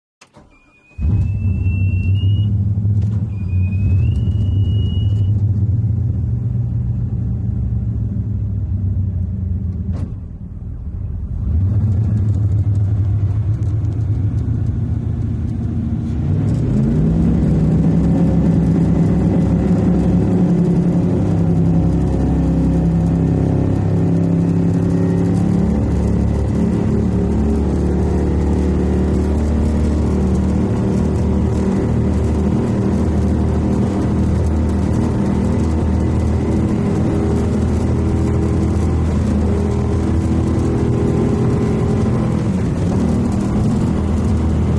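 Power Boat Interior Start Away, off shore power boat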